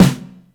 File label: DAMPED EQ, SNARES; InVision Interactive; Lightware VOL-1